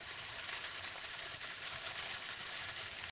Fuse Burning; Learn to Fly 3

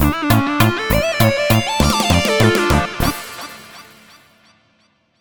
VS Draw; Bomberman 1 & 2

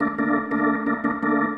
Combat High intro; Graveyard Ops

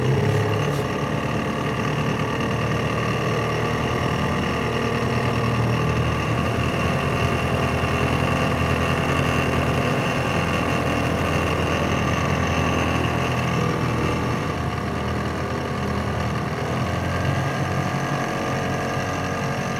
vehicle smallboat drive; teardown; ps5 windows xbox series xs

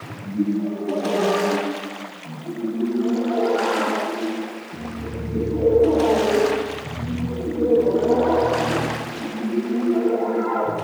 big ass tentacle thrashing; biomass

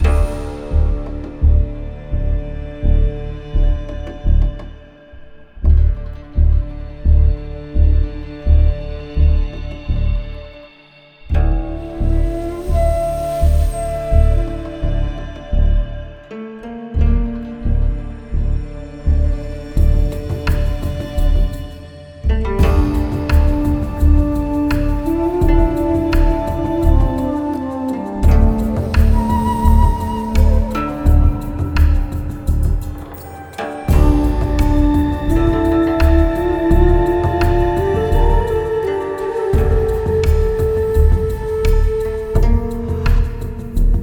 music area caution; Aragami

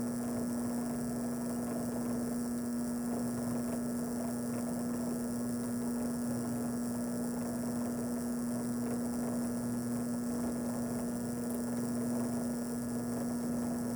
light machine

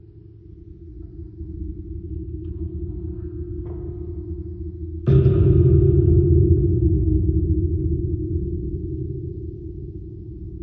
Metal Ambience LOOP; unrest indigo

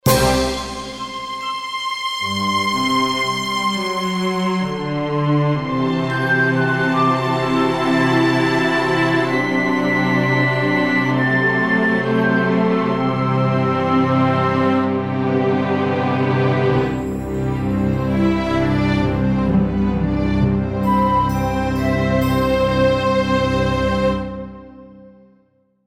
Level Clear; time blazer switch gamerip 2022

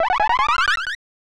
Heart; Bishoujo Senshi Sailor Moon S Juugai Rantou Shuyaku Soudatsusen; snes

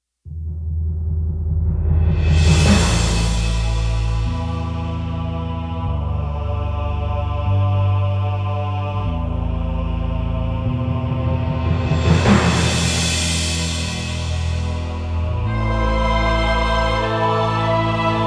TENSION

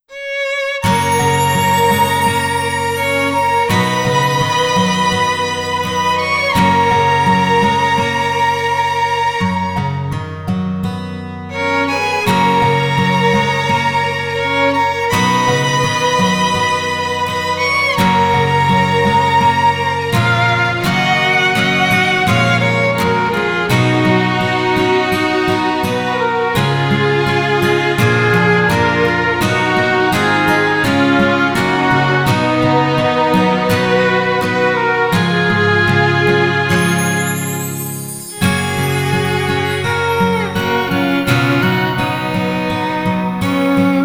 Acoustic Ver.

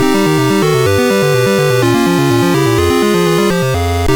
Round Start; Iron Trackers Atari ST gamerip 1989

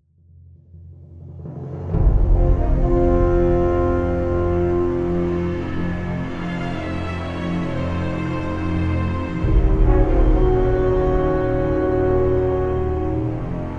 Jingle Arab; rise of venice windows gamerip 2013

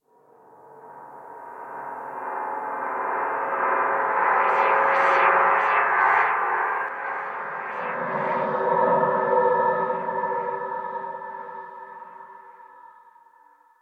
Combat Horror

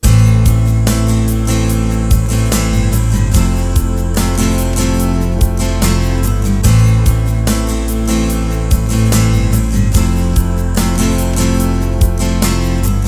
Lose Jingle; Colin McRae Rally

